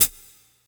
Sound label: small reverb hat sample B key